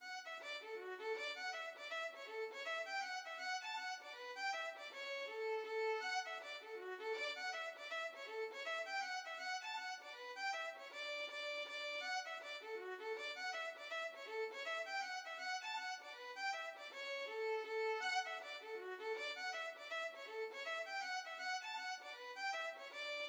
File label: tomorrow morning violin